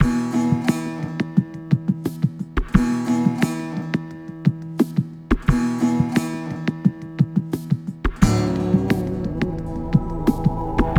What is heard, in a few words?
bar beat; Star Wars Knights Of The Old Republic II; XBOX